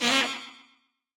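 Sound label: Woodwind damage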